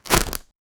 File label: plastic bag unzipping